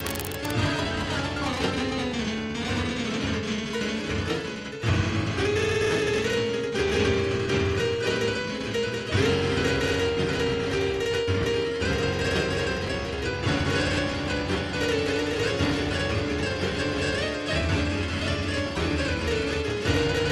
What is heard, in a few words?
guitar electric, MIDI